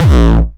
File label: 909 Grime A1, Kicks; Drums; MBase 11 - 909 Grime